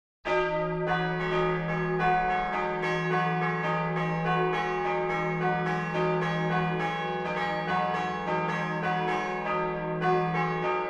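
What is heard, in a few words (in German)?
typisches Geläut; Beierleute; Eckenhagen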